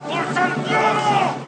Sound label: charge, Voices Emotes, sp